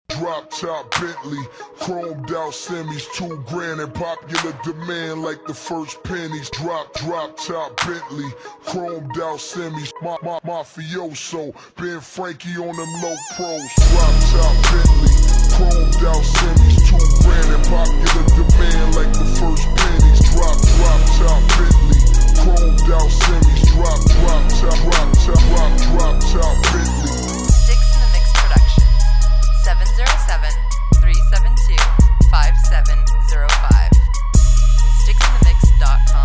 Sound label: Drop Top